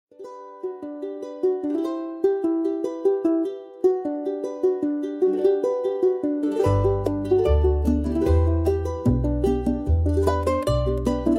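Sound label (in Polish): Muzyka Hawajska; Mele Hawaii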